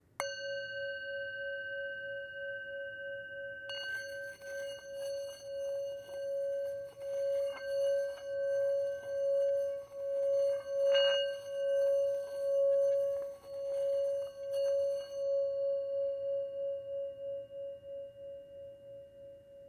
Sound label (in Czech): tibetska misa; Tepaná tibetská mísa Jinpa o hmotnosti 384 g, včetně paličky; Jinpa malá